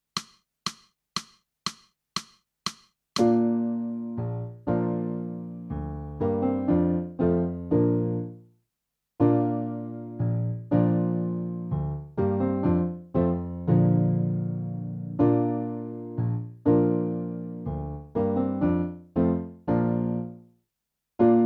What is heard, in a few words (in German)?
menuett120